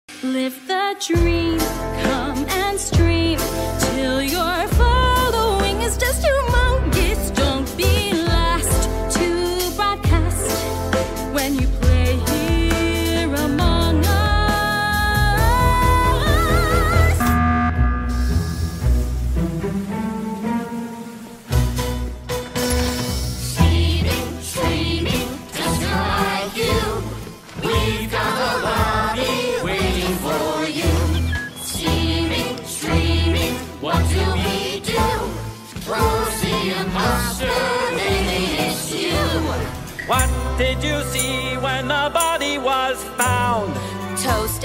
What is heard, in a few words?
fat musical short; Operation Starched Silliness